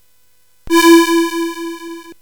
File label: Dark Castle, CSG8580R5